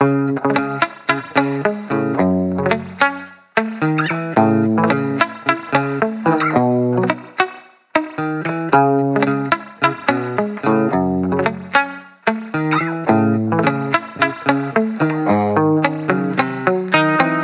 Guitar Bpm110 Key C# minor Full, 70s Guitars Full Mixes; Fox Samples; Back to the 70s Guitars